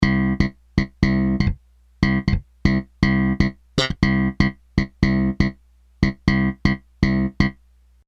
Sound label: bass